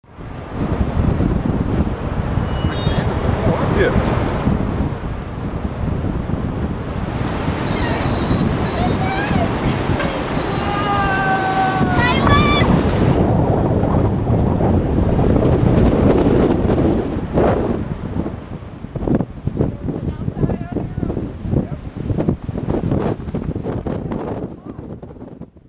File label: Windy